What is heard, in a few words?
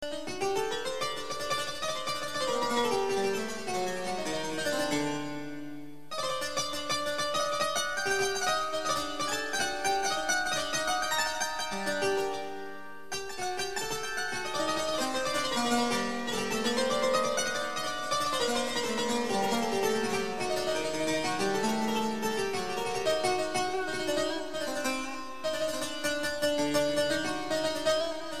Kanun